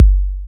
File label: Bassdrum; Vengeance Samples